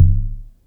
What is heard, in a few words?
SYNCUSSION